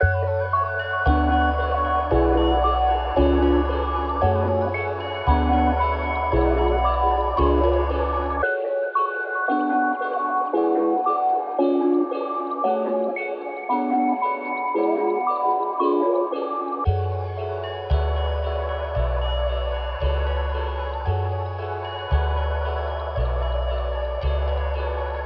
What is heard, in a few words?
114 BPM F# Min, Trap Melody Loops; Fireflies; Cymatics; Nightmares Trap Melody Loops